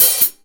OHH, REAL DRUMS, SWING KIT; Best Service ProSamples vol.43; Real Drum Kits

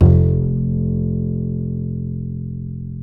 F 2, Bass; Spectrasonics; Bass Legends

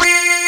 SYN, SYNTHESIZER; DIGI WAVE #1

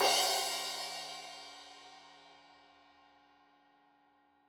Crash 15in L edge, Cymbals Stereo

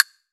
CASTANETS

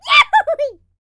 shouts; World Voices; YODEL YELL; ILIO; Vocal Planet

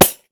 10 PANDE, PANDEIRO

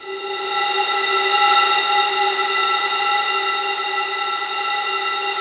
SLOW STRINGS; Music Store; 90's Sample CDs; Yamaha VP-1; SLOWSTRINGS4

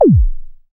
BD ANALOG, Synth; Sound & Vision - Gigapack II CD 2

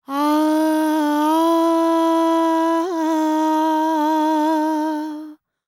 World Voices, INDIA; ILIO; Vocal Planet

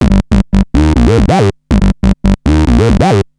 Analoop, Industrial Analog

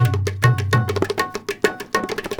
India, Heart of Asia

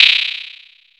kwerk, machine perc; Zero-G Ambient 1

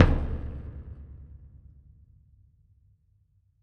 Ceremony Drum; Sonic Foundry (Sony Creative Software); Joe Vitale Latin Percussion

